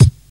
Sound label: snappy kick sound c key, bass drum sample tuned to the C note